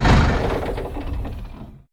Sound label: Vehicle Engines; Red Orchestra Ostfront 41-45